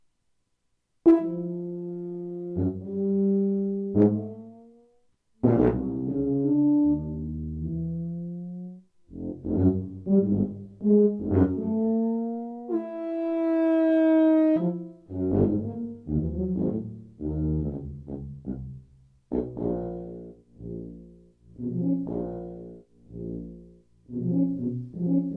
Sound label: Poema per Tuba